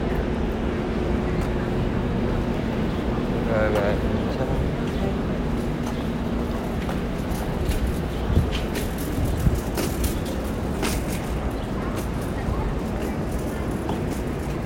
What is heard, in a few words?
Oriental Rugs Gallery